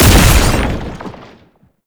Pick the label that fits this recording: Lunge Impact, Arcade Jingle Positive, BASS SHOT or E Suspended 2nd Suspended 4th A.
Lunge Impact